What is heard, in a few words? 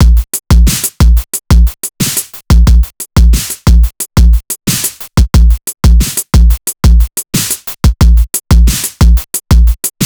Groove left hand 90